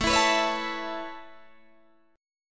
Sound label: A Major Add 9th A Guitar Open D, chord